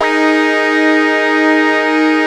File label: AQUA TARK, Synth; InVision Interactive; Keith Emerson; The Most Dangerous Synth and Organ; SYNTH LAYER